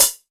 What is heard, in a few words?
short pedal hi hat sample g sharp